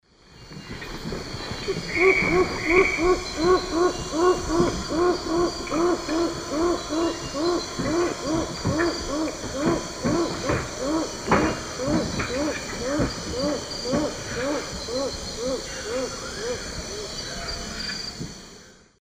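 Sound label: Tigrisoma lineatum, song, birds; S. America; Eastern Lowlands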